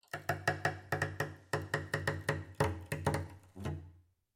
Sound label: Cello Scratchy Noises; SoundsCrate